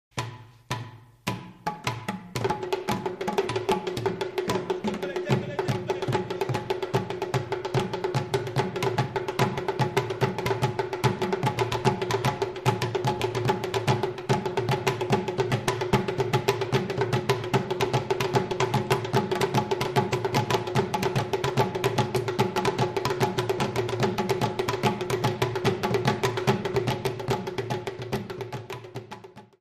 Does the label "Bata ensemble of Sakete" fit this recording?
Yes